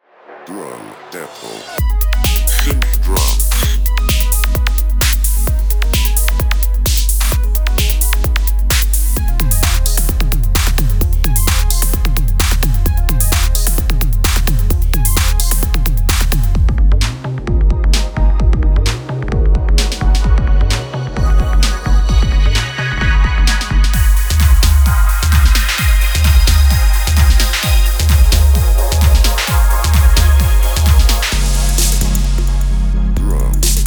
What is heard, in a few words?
Drum; Drum Depot; SiNTH DRoMS